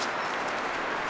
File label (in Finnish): tuollainen rakattirastaan lentovihellys